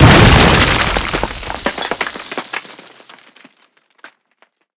detpack cement; Call of Duty Modern Warfare

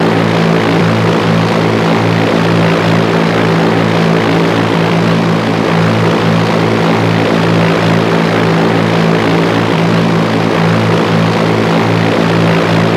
helicopter tail rotor close; Rust

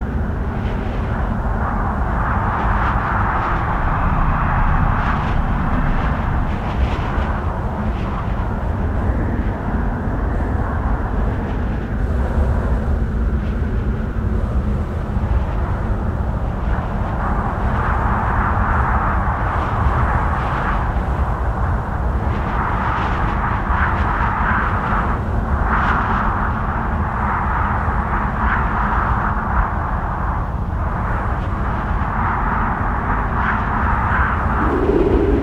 ambient aftermath

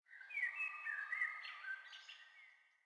sting songbirds; Rust

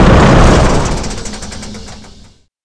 Vehicle Engines; Red Orchestra 41-45

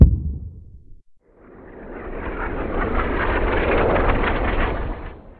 Artillery; Red Orchestra 41-45

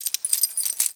dog collar long; animal; Call of Duty Modern Warfare

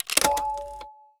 insurance insured; Escape from Tarkov

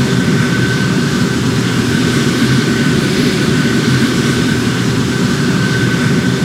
jet rear, engines; War Thunder

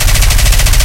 vickers k loop right, guns; War Thunder

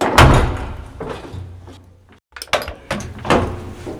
Vehicle reloads; Red Orchestra: Ostfront 41-45

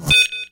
gunner injured; War Thunder

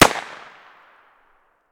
grach fire outdoor far; Escape from Tarkov

